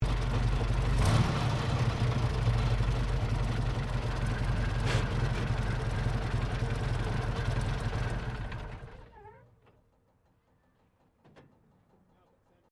TANK CENTURION PRIMARY ENGINE SHUTDOWN, British Centurian main battle tank; Vehicles